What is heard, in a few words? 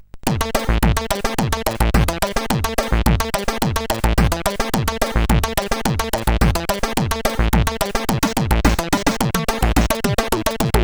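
Funk Music, MOS CSG8580R5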